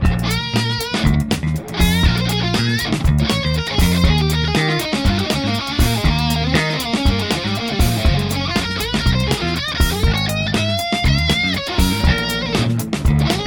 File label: funky blues